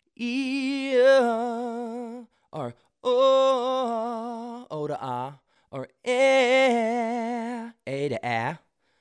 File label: modify a vowel example